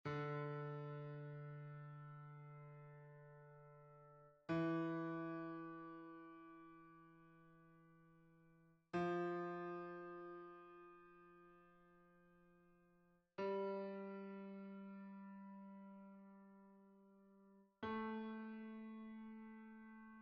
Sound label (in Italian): basso piano